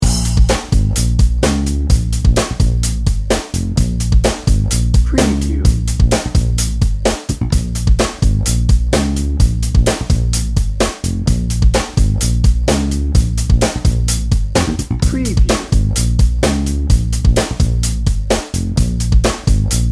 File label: funky feel good dnb